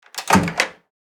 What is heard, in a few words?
Microwave door open; appliances